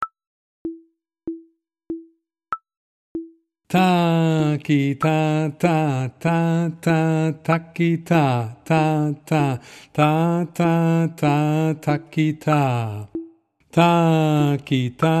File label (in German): rhythmisches raetsel